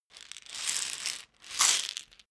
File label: pillbox shake